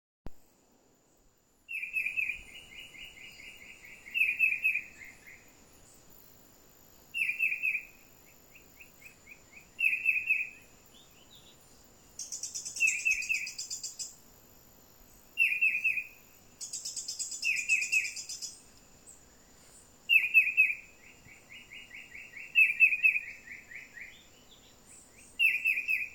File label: green shrike vireo, birds